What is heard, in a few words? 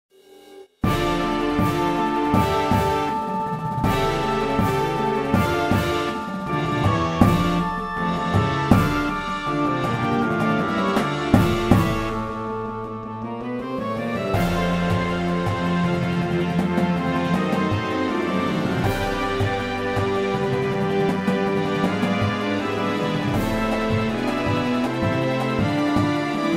Dies Irae, perc